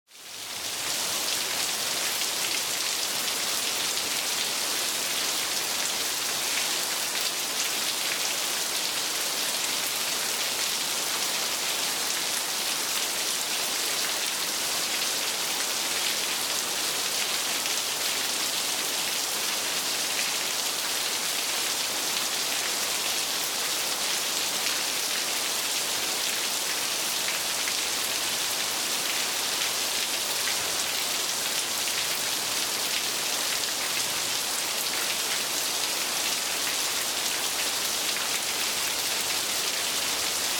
Weather Ambience Heavy Rain Downpour Splatty

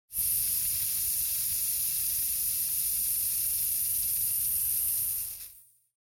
Household Deodorant Spray Fizzle Long

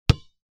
Sports Basketball Single Bounce

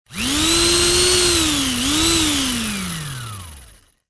Industry Engine Power Saw

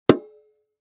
Household Bongo Hit Large Drum Single

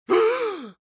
Human Male Gasp

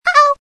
warn

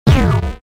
Multimedia Internet CD Rom Flash Blast

